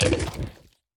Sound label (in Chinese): 嗅探兽进食声